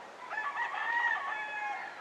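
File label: rooster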